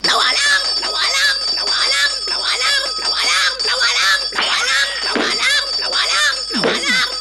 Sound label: alarm